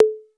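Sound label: 808 conga high, TR-808; East West; Global Sounds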